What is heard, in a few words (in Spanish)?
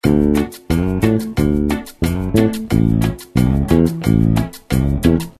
Patron bajo cumbia; how to play cumbia bass